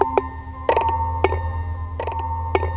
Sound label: LOOP, WEIRD ATMOS; Zero-G Ambient 1; WEIRDATMOS#1